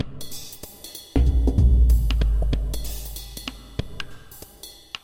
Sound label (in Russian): solo na barabanah